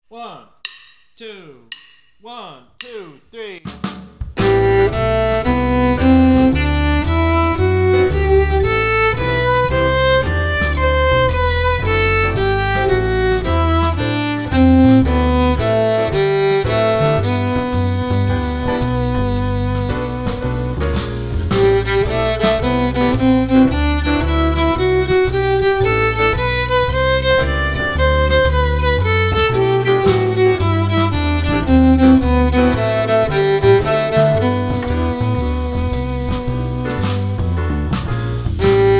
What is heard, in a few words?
Play-Along G Major Scale In Quarters, Swing Eighths And Mixed Rhythms